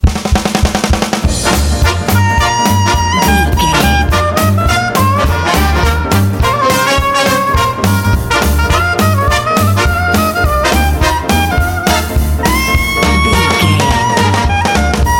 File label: jump sock hop soprano solo